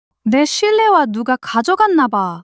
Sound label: surprise